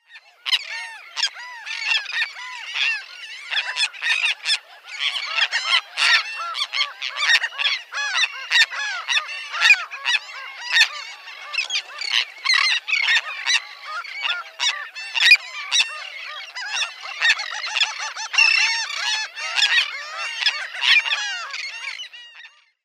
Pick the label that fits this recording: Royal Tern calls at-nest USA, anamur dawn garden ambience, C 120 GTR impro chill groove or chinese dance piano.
Royal Tern calls at-nest USA